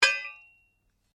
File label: Blade Heavy Moving Impact, Sword